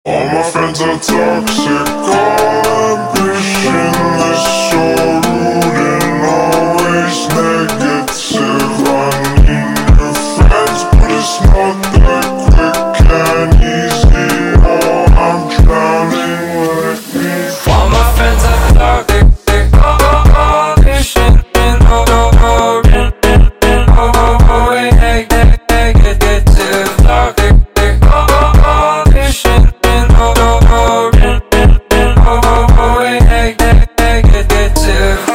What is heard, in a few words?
Funk slowed